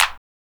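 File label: CLAP; E-Lab; X Static Goldmine 1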